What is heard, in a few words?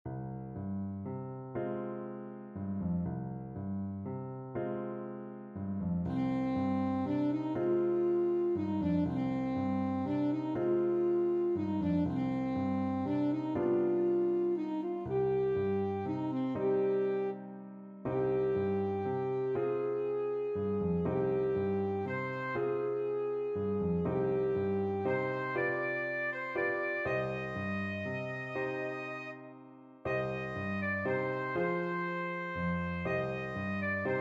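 alto saxophone; hashivenu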